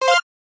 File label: gem pick; astro Legends Lyra and Erion Desktop; Foxium